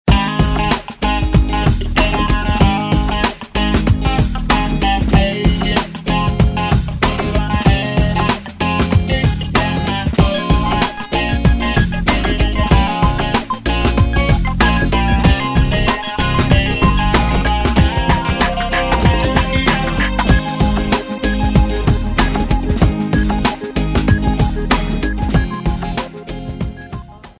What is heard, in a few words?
pop in